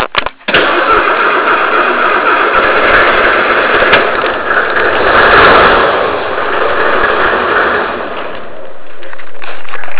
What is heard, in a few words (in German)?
Rundhauber